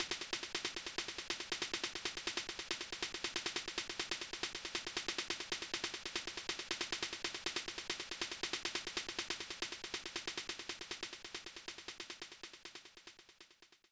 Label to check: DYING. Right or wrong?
Wrong